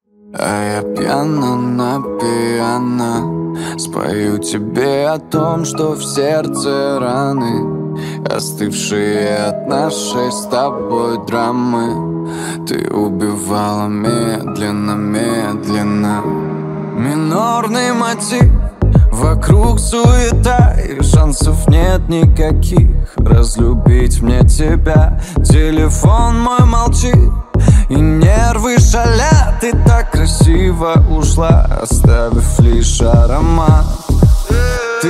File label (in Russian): pyano na piano